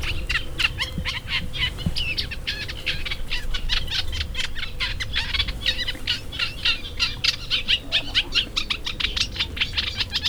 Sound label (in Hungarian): szólókarrier rovar repülő; hortobágyi halastavak; Kondástó